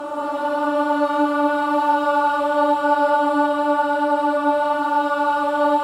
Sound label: BOYS AHS, Symphony of Voices